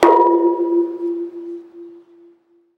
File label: Baseball Hit Metal Pole